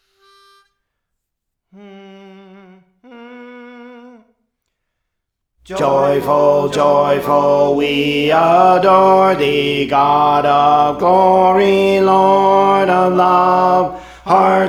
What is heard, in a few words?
Alto; Praise For The Lord Training; Joyful Joyful We Adore Thee